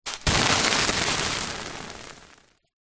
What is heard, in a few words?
horror acid burn; zapsplat